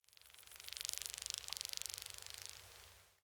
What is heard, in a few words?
Forest Open Glade Grass Hopper Fly By, Day; Ambience